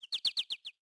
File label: goldfinch; World of Tanks Blitz; hangar blitz